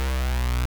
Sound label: bee bass; 'Go Fuck Yourself' samples; lolicore